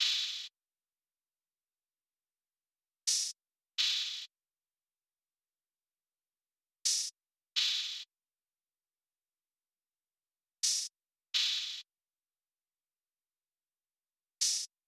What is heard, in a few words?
Drum Loop 127 BPM Open Hat; Loop Stems; Cymatics; Vibes II Drum Loop Collection; Matriarch Drum Loop